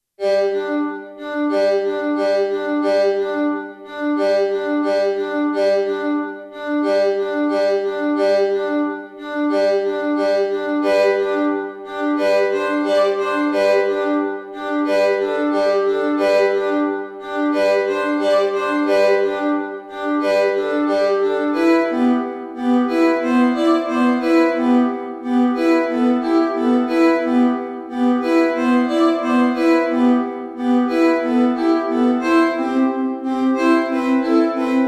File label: Violin Fun